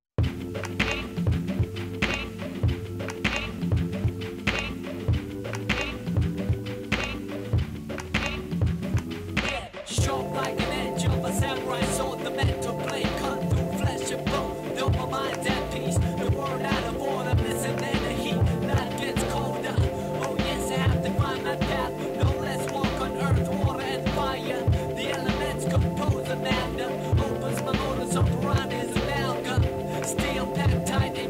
battlecry

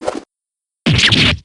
shank; shiv

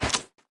gun catch; hutch

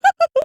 giggle; sayaka

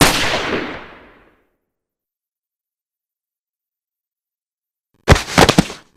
shot dead; amara

